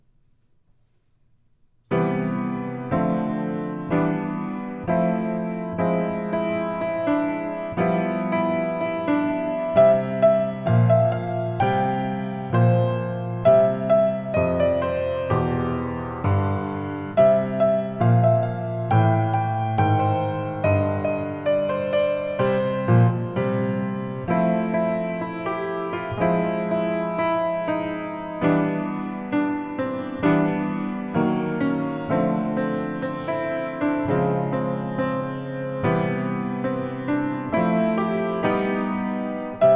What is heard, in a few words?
Seventh Street Blues, recital